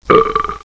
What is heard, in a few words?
Burp